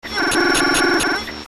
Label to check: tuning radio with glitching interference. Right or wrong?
Right